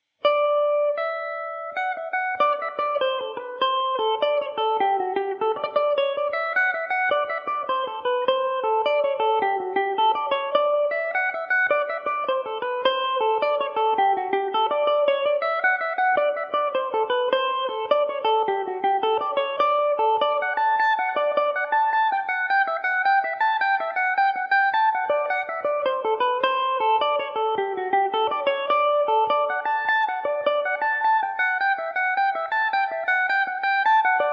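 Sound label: D mixolydian mandobird, Irish mandolin; an t athair jack walsh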